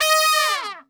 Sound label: D#3, Brass, TRP; Best Service; Brass Super Section